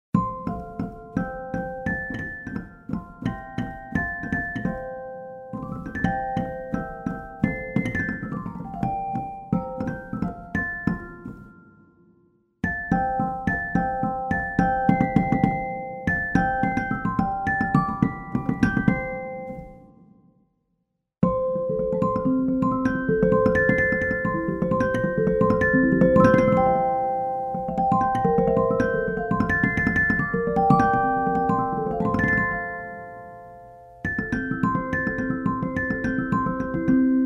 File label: dulcitone celesta; Mozart; Zauberfloete; Ein Maedchen oder Weibchen; edition beurmann